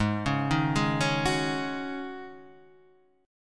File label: C sharp Suspended 2nd Suspended 4th, G sharp